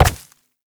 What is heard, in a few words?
Step Vegetation; Movement; Uriel; Warframe